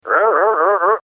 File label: sewing machine sound